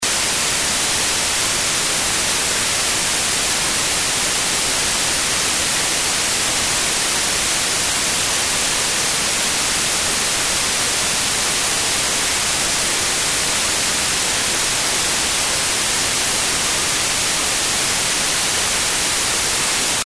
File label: noise white